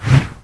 whoosh long

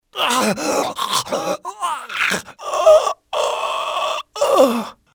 writhe, pain death, male; Soldier Of Fortune II Double Helix; XBOX

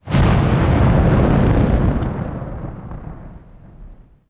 explo flaming; impacts explos

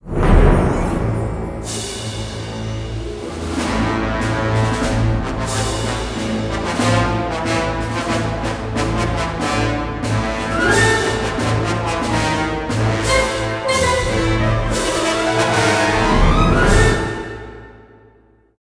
music flourish, intro

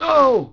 bail vox; skater; Tony Hawk's Project 8; XBOX

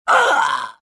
pain

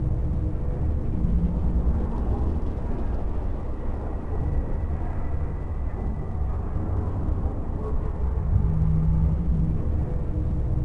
amb crypt; Star Wars Obi Wan; XBOX

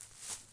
footsteps grass

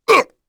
get hit voice; UFC Tapout; XBOX

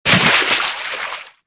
bodyfall water; player

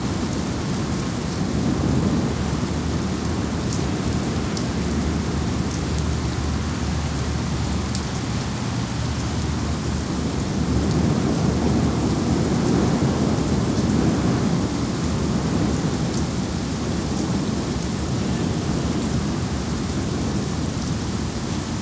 Rain light, Ambience; Project Gotham Racing 2

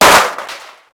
Break subway sign; Max Payne

